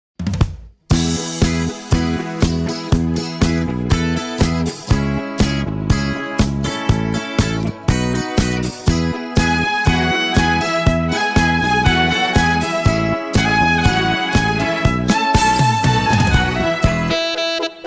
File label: sax violin